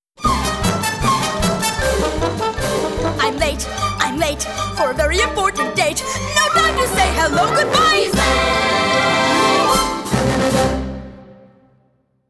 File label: Reprise Guided Vocal